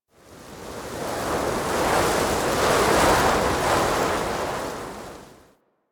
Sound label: Forest Wind Fast Gusts; Ambience; Warframe; Grineer Forest